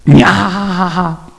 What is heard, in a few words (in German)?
lach; svencoop